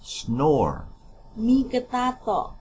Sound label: snore